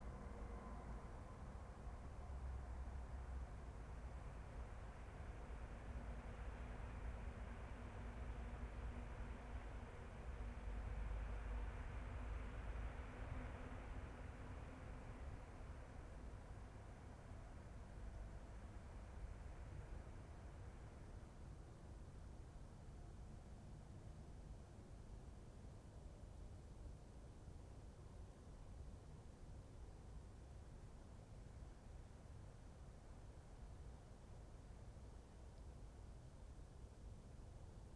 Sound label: yamanakako sound